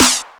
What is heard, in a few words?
Snare Clap; 90s Sample CDs; Club Techno; Percussion